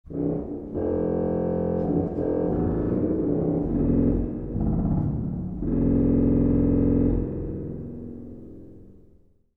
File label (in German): posaune